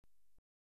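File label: Variable Velocity Gun (Single Shot), Electronically Generated Sounds; BBC Sound Effects Library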